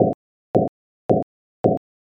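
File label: footsteps soil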